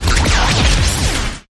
Big Chaos

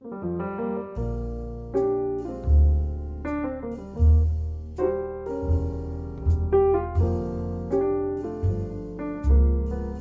With Trio

